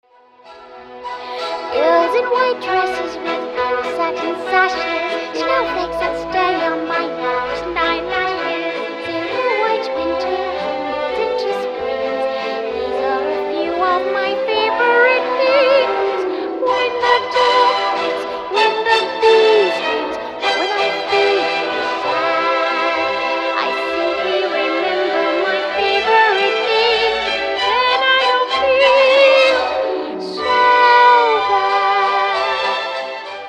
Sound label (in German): Gesang